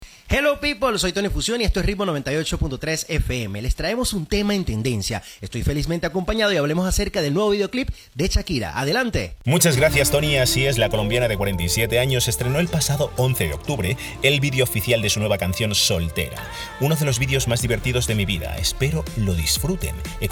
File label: jingle